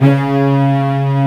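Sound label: C#2 contrabass; AKAI S6000 CD-ROM - Volume 1; Strings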